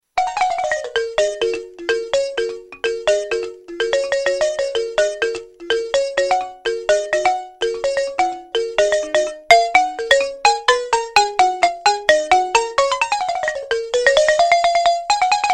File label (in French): bala; instruments